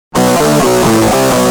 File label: G Jam, Guitar; Industrial